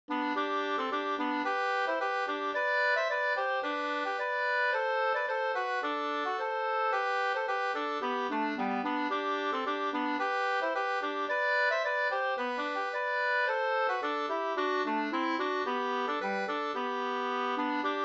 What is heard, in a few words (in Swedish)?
ganglat; Tor Ebenholtz